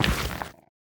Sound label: step, netherrack; block; MagicRPG